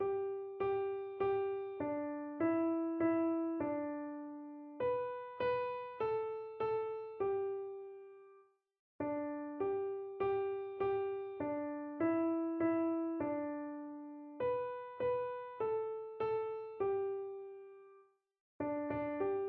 old macdonald, piano

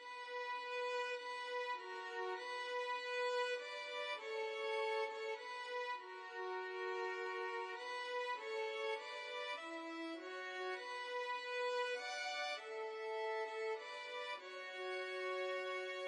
coventry carol violin duet

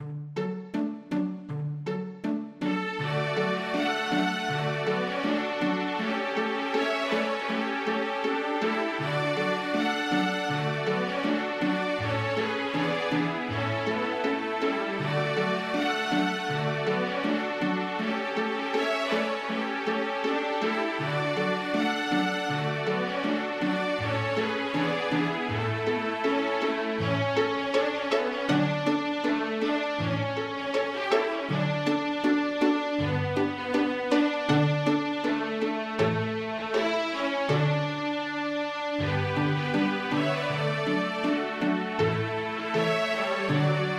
Trout, string ensemble

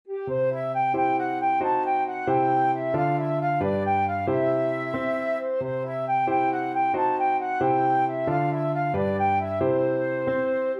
So Im Off With The Good Saint Nicholas Boat flute

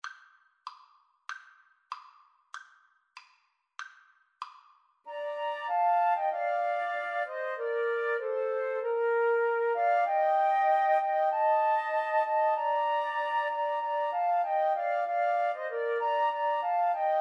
joy to the world, Flute Trio

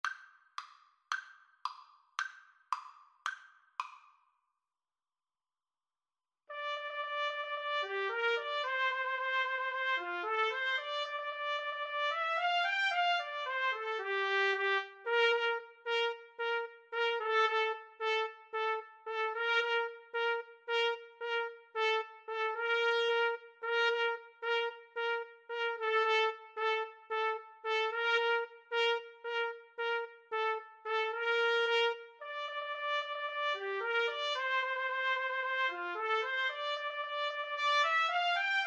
drunken sailor trumpet duet

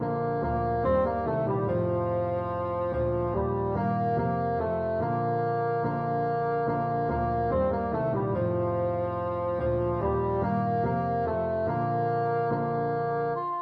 ding dong, bassoon

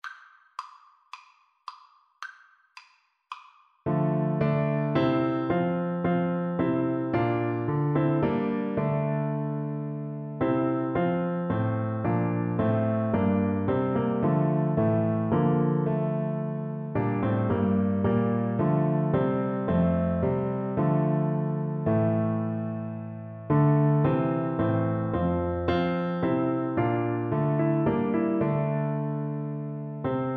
Bassoon

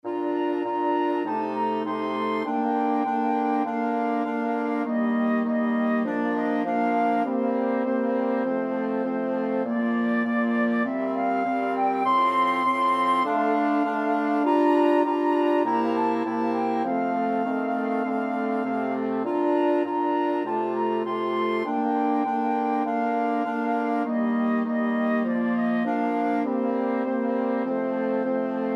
flexible mixed ensemble, 4 players

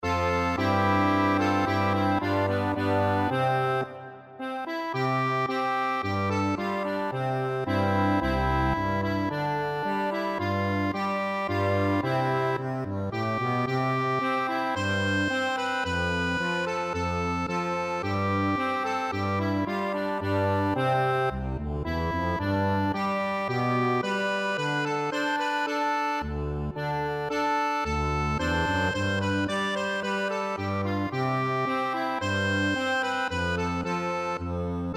accordion

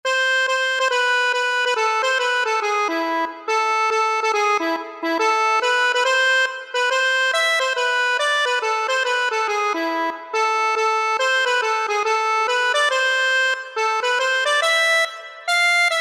dear eveleen, accordion

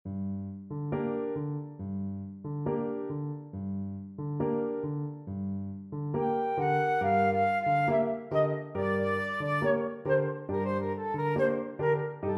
habanera, flute clarinet duet

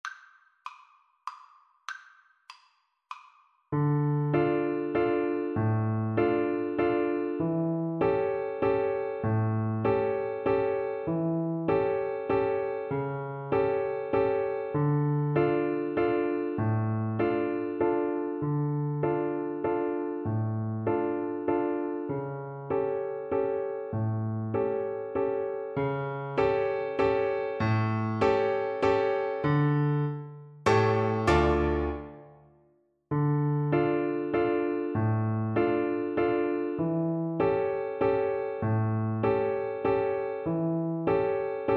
dios nunca FL kar, Flute

